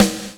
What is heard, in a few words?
snare disco; Roland L-CDX-01; Snares 6; Sn Modules 6